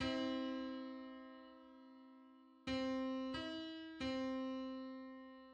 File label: Six-hundred-twenty-ninth harmonic on C